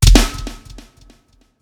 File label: fire; smite; god raijin